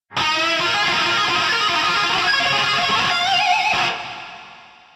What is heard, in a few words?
lick; brutal legend